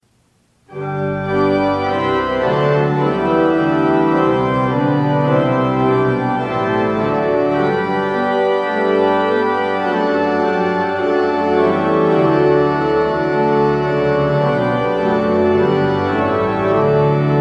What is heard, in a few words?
playing organ amplified